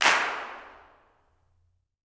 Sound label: CLAPS; Vocal Planet Vol1 Gospel; CLAPS+SNAP